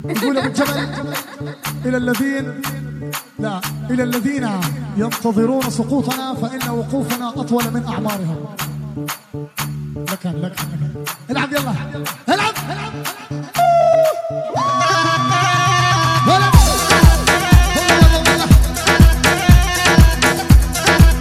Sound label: Bass Music